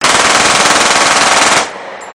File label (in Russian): pistolet glock 18